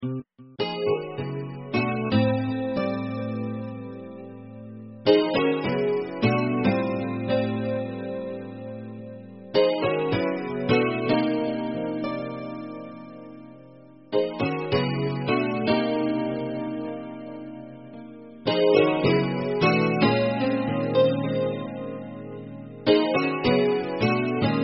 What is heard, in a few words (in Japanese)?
ナイロンギターの音色にリバーブ・コーラス・ディレイをかけていい感じに聴こえるようにしています。; 照明に浮かぶガラスとコンクリートの質感